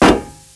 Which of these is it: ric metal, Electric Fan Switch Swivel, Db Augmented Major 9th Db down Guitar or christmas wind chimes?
ric metal